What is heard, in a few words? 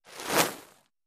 Arrow Bys Fire Sizzle; bow & arrow bys